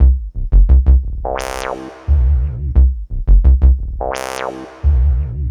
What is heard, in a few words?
Upbeat Bass, Drum & Bass; Fluid Dynamics